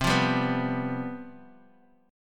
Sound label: C Major 7th Suspended 4th, down, Guitar, Open D